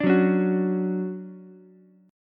D-Major 7th, D, Ukulele; strummed; JGuitar

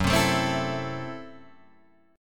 F sharp Minor 7th Flat 5th F sharp Guitar Open G

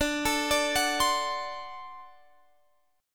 D 7th, down, Guitar, Standard; D7 arpeggiated; capo=3

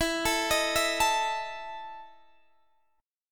E Major 7th Suspended 4th E down Guitar Standard; EM7sus4; arpeggiated; capo=2

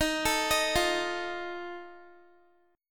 D sharp Suspended 2nd Flat 5th, Guitar; D#sus2b5; arpeggiated; x,18,19,20,17,x

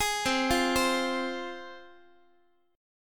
C-Suspended 4th Sharp 5th C down Guitar; Csus4#5 arpeggiated; x,x,11,10,9,11; JGuitar

